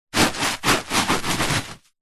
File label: household soapy foam bubbles bath pat movements hand